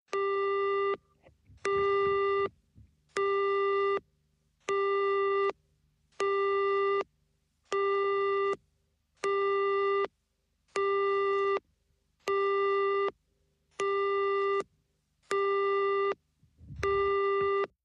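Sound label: British Telephone Busy Tone Earpiece; early 1960`s; Foreign Futz Busy Signals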